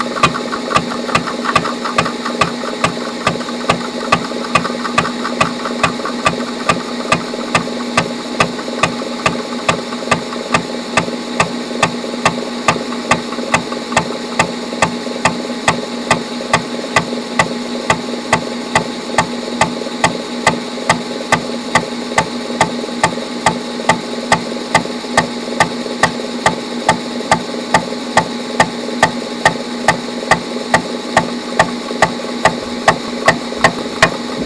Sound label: drive click LOUD